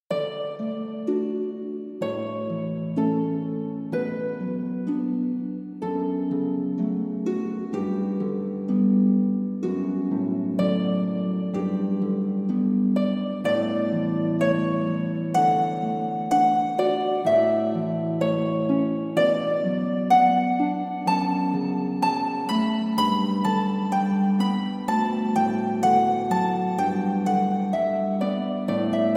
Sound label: Canon (Harp)